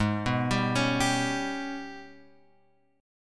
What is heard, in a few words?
G sharp Minor Major 11th; {4 2 5 X 2 3} arpeggiated